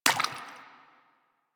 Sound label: Pirate Footstep Default; Warframe; Warframes